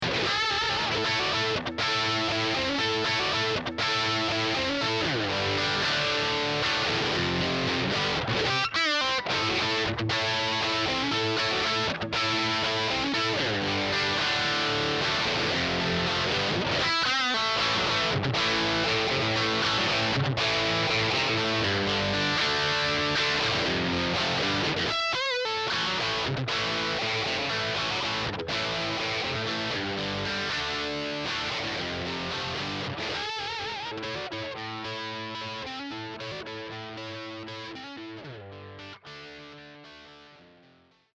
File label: crunch; vox ad15-30; ac30 tb